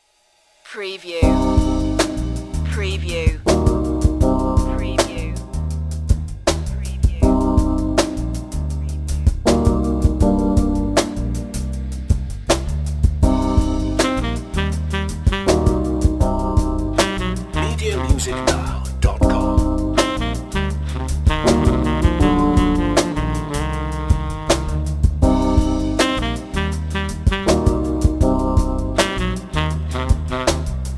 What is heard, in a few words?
jazzy chill sax